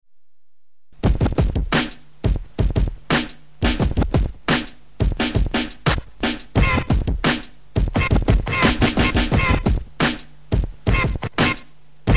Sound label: scratch that sound